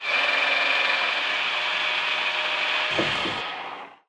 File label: Aircrafts Pin; DCS